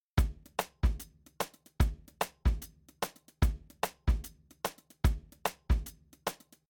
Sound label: Drums, shuffle drums; WDR2